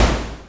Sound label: boom; vinylgod